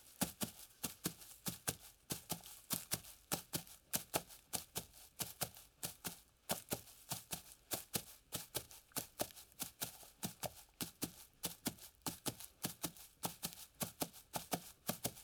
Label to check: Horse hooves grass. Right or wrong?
Right